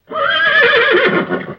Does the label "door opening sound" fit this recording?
No